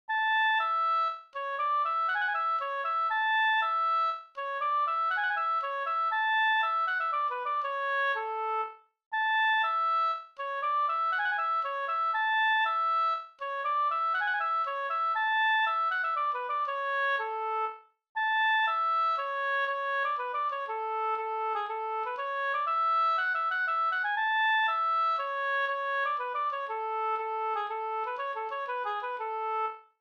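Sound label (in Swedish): polska Gokpolska fr Kalland Västergötland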